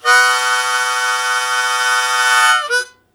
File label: Blues Chord Attack B1, Harmonica; Zero G - Harmonica